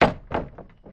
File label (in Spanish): puerta